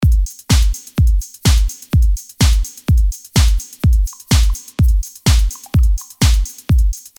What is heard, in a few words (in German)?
woodblocks beats bauen synthesizer